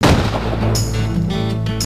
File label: death; singe